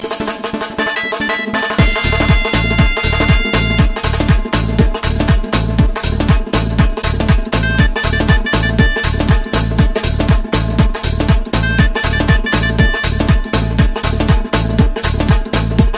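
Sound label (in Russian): kavkazskij tanec, ringtone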